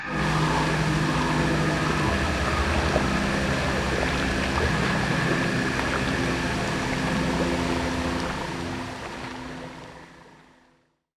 tropical paradise; Stellaris; astral planes

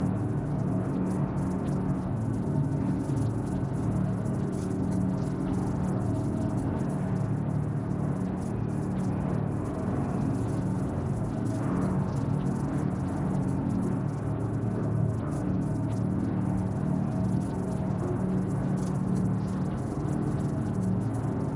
neuron calculator phase 1 idle; Stellaris; machineage; superstructures

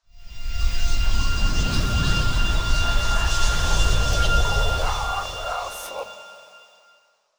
psionic comms; Stellaris; astral planes

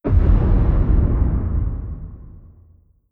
distance ion cannon fire; Stellaris; apocalypse

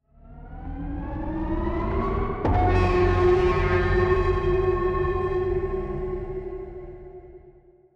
psi ship science ship move; Stellaris; shroud

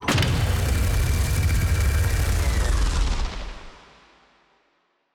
plasma drill; rifts; Stellaris; astral planes

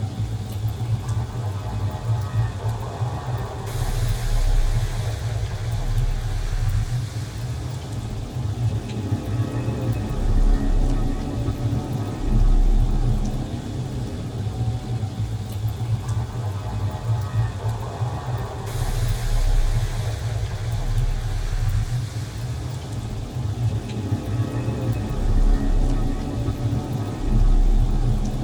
ship corvette idle; Stellaris; machineage

